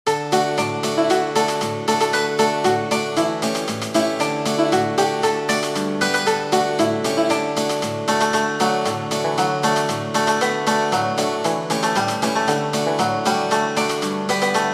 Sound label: banjo